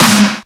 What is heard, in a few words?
Snare Clap; FLPRO